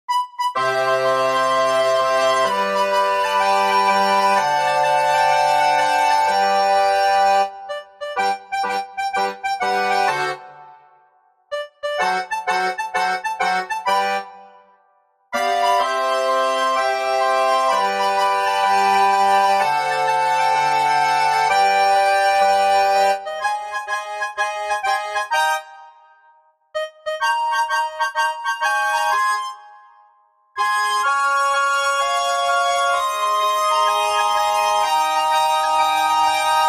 if youre happy and you know it clap your hands accordian; bbc